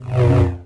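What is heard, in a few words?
sith lightsaber Swing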